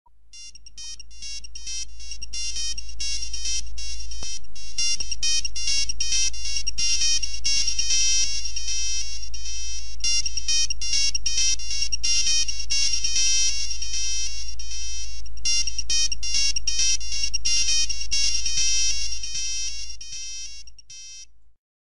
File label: nokia old with echo